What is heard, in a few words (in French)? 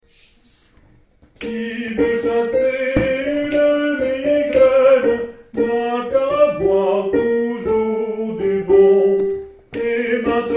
CHANSON A BOIRE Soprani